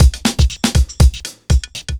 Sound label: chemical 120, loops 120bpm